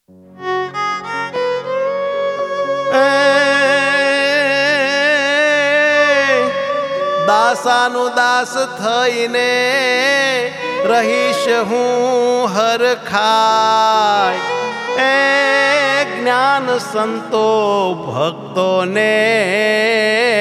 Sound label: DOHO WITH VIOLIN